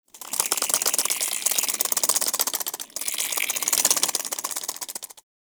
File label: Companion Foley Shake Long, Pets; Warframe; Characters; Zanuka